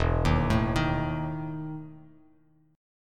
E Major 7th Suspended 4th E down Bass Standard; arpeggiated; JGuitar

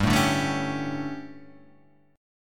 D Major 7th Suspended 4th, chord

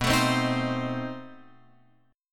B 7th Flat 9th B Guitar Open F; B7b9; strummed

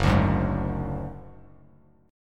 F sharp Suspended 2nd Sharp 5th, Bass Standard; strummed; JGuitar

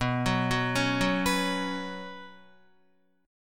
B Suspended 2nd, Guitar; Bsus2 arpeggiated; 6,9,6,6,6,7; 4F,4A,5C,5G,5C,6E; JGuitar

